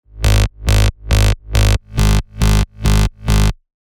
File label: Reversed, techno bass; Spire; revealed spire techno bass vol 1